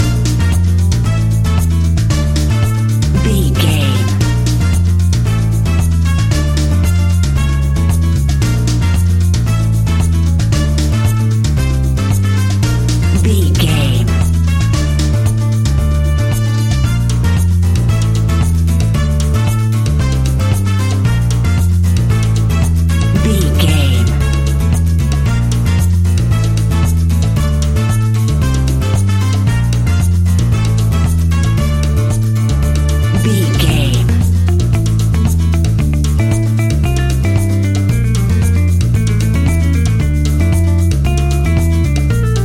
exotic latin spanish music, An exotic and colorful piece of Espanic and Latin music